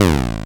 down

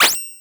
slash

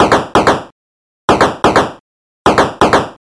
train

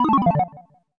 computer down

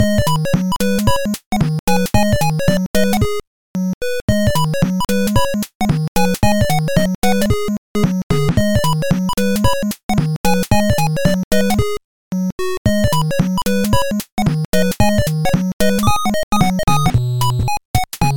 dog

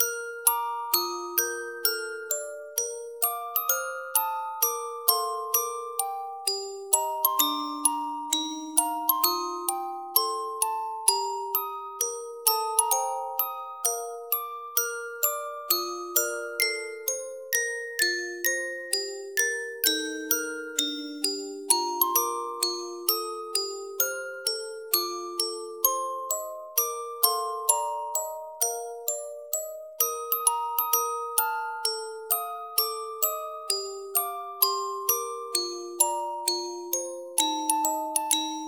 saezuri musicbox

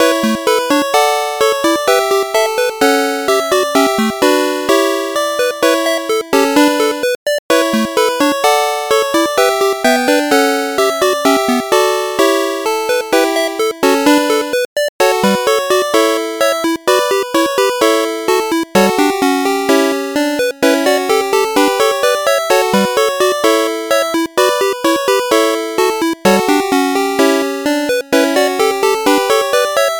magical market

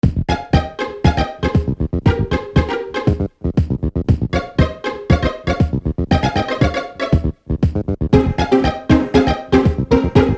retro

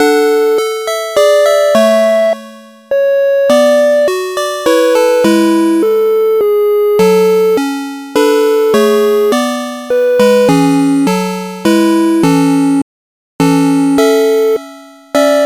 gameover

